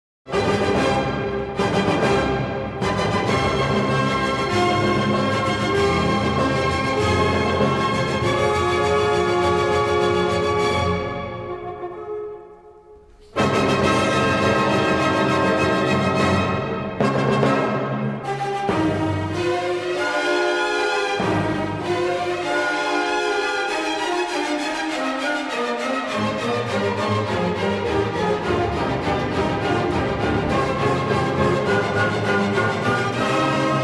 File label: music